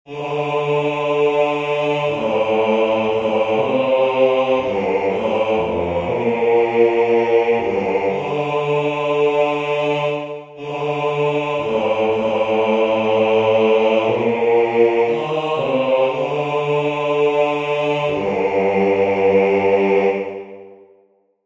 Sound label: Bass